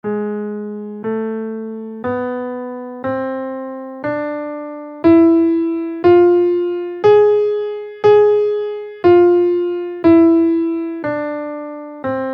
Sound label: ultralocrian scale in Gm, guitar